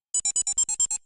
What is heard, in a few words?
Digivice Beep Clean